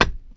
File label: metal solid, footsteps